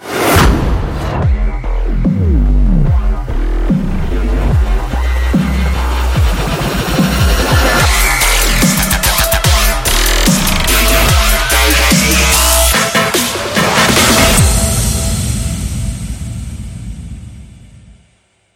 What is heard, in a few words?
dubstep filter logo